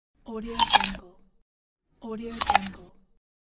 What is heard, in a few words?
apple bite; bbrealsound